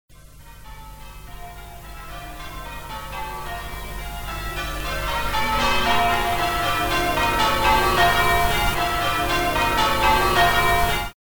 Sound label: Bells